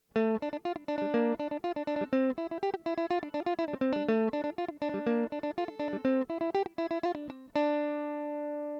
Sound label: mandolin comparison electric tb